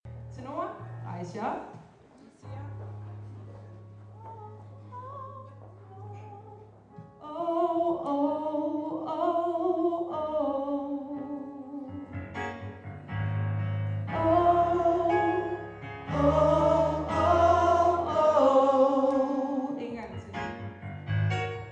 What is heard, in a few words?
tenor; kor